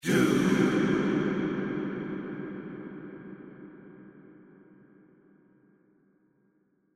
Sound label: Wet, reverb